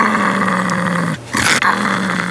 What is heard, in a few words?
GROWLING; SCARY